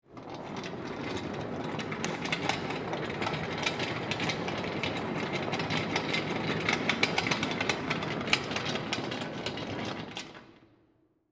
Cart Roll By Garage, Industry, Cart, Roll, By, In, Garage; Rolling (Sound Effects:Objects)